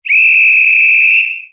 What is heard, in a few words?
depart steam whistle